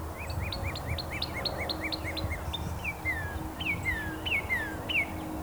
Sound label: female male